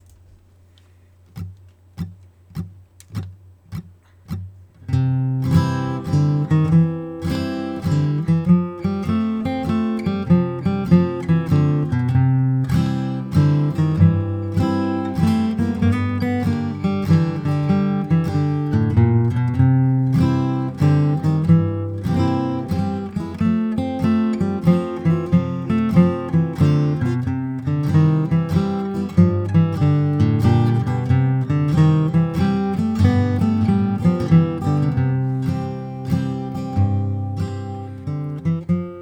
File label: Bluegrass Solo Backing Track; Bluegrass Solo 4 Example